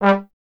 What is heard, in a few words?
Trombone G; Miroslav Vitous - Solo Instruments VOL-1